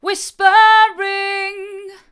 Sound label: whispering hard